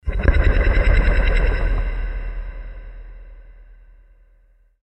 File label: monster deep breath